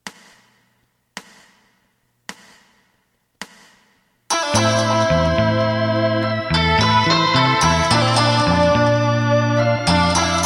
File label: drum